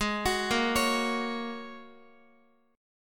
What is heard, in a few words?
Ab 7th Suspended 2nd Suspended 4th, Guitar, 4F,4A,5C,5G,5C,6E; x,x,8,11,10,9; arpeggiated